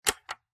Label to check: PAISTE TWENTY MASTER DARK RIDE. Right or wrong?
Wrong